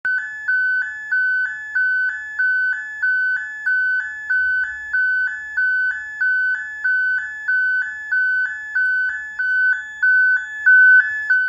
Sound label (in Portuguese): sirene alta potencia